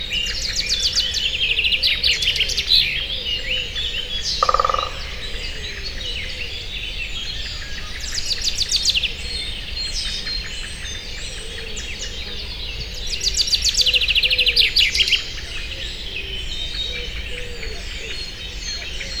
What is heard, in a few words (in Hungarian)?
ketszer, harkaly; termeszetben; harkaly professzionalis; vizhaz; cardioid